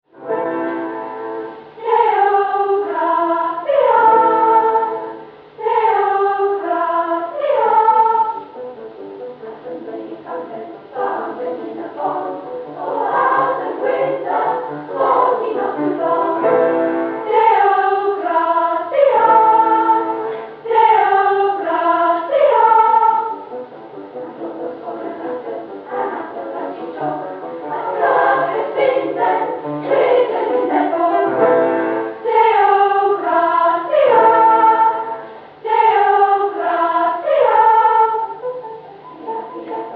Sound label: choir; Ceremony of Carols